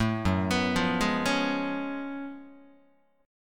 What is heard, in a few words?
F sharp Minor Major 11th, Guitar; arpeggiated; JGuitar